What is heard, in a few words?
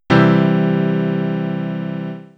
C major triad, piano